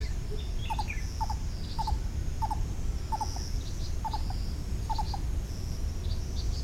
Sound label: coucal lesser Centropus bengalensis